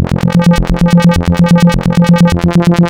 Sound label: Spectral, wavetables; Architecture 32